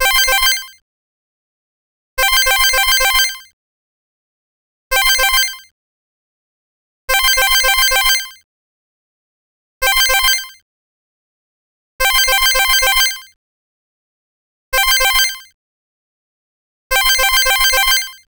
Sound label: Sonar; PlusFourSix; Josephine (G700)